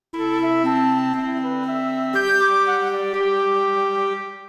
rock ballad in g, querflöte, klarinette, cello

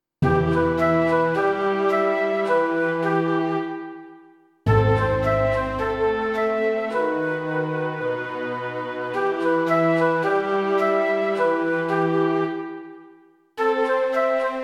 Classic Theme Symphony Orchestra

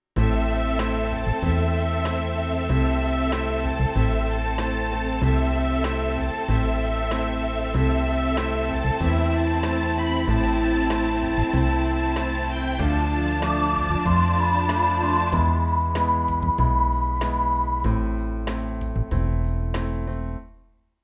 New Melody

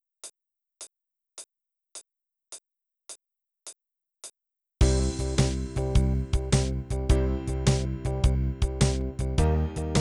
Blues Rock Shuffle 105

